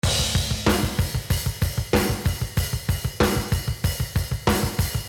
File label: Drum After Heavier